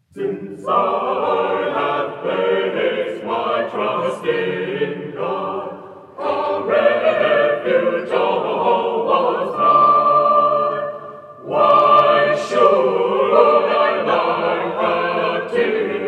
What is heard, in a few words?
The Bird, Acappella, Chorale; William Billings; USC Chanteclairs